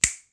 Finger Click

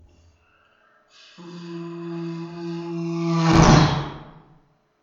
Fast Car Passing By

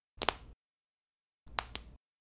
Popping Knuckles

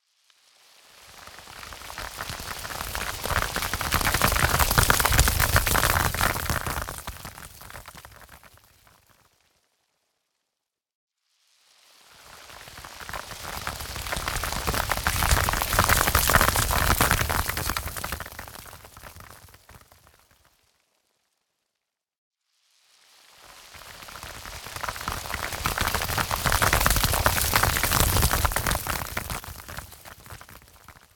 Rockfall Short Down The Slope With Reflections

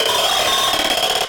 Glitch Sfx

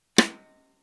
Rim

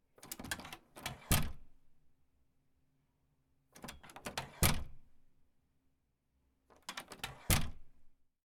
Metal Door Handle Opening Closing

